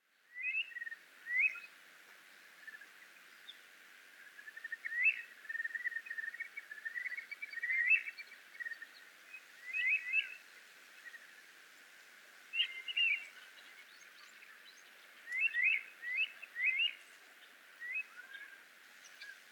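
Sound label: Falcated Duck, hyogo; ヨシガモ; 求愛; 兵庫県西部; 黒田治男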